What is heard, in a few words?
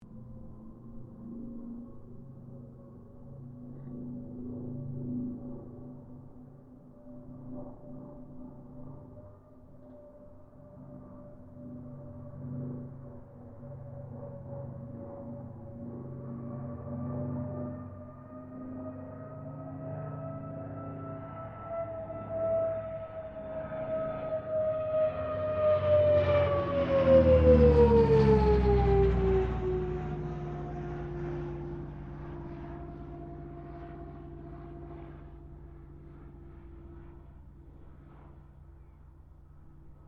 AIRCRAFT CA-13 BOOMERANG PASS STEREO